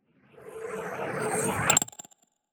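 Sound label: magic soul trap capture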